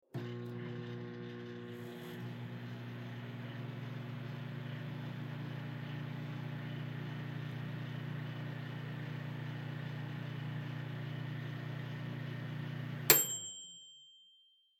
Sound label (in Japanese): 電子レンジの音; microwave-oven